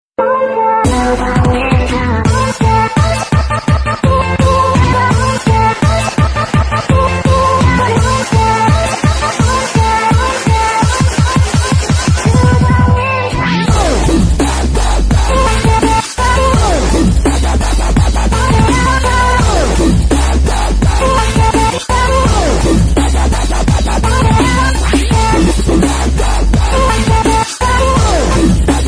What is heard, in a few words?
Monster Drop Trap